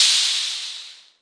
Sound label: mrk hh, Maestro Rhythm King drum machine